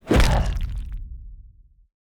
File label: ogre ground pound